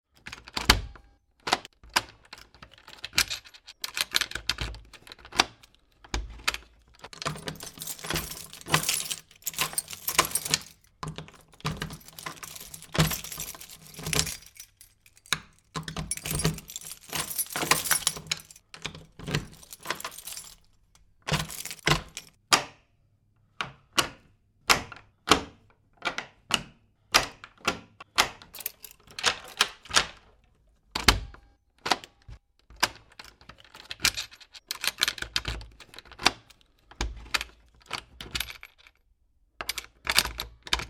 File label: Locks with Keys Various - Locking and unlocking a variety of door locks; Lock Sound Effects